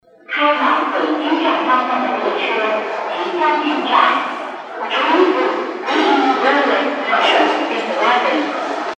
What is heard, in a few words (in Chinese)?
2号线-北京站方向进站